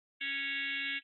Buzzer High Clean

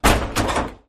Axe Hits Door Heavy Wo

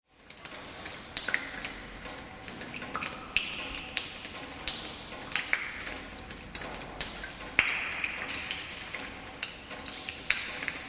Very Airy Drippy Reve, drips

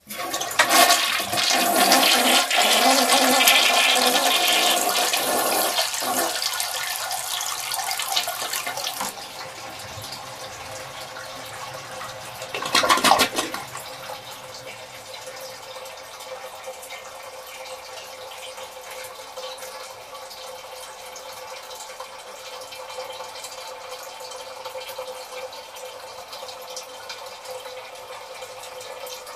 Toilet Flush, toilet

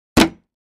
Gallon Jug Hit Hammer, plastic

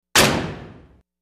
Industrial Oven Door

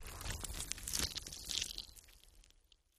GUT SQUISH, gore

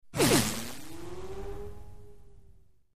Synth Laser Zap By Pan, zaps

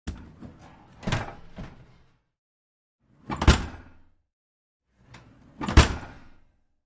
Oven Door Open close; appliances

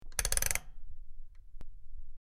Rotating Switch Clic

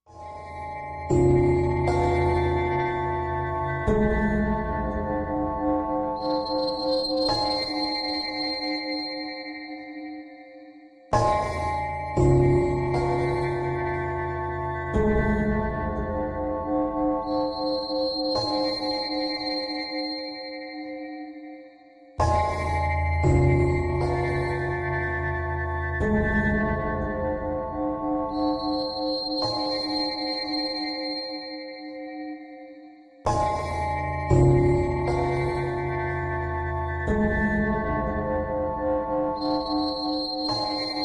Bells Suspicion Low Bell, chimes

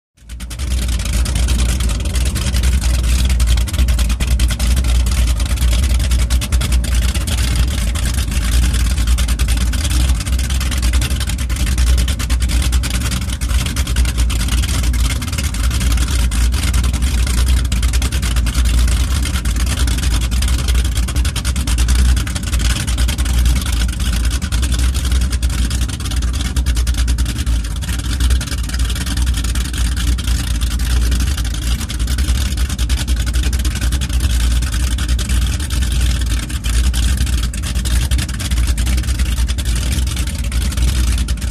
Power Boat Idle; off shore power boat

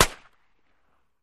Gun Shot Dry Sngl Sho, shots

